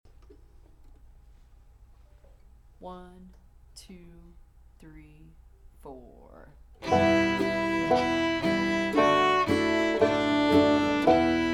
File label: Shady Grove Slow Jam, fiddle